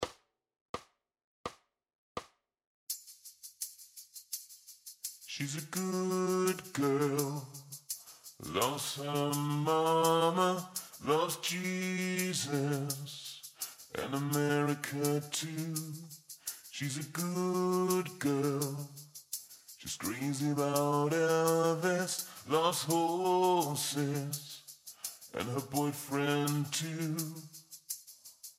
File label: D uten gitar